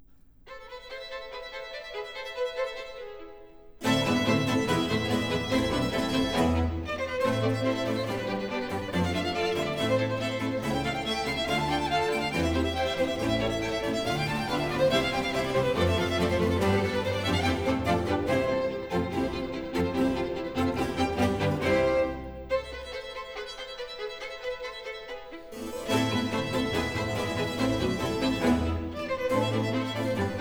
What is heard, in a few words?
Concerto for violin, strings & continuo - Presto; Telemann, G. P.